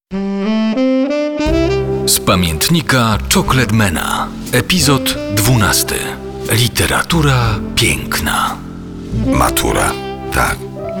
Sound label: SAX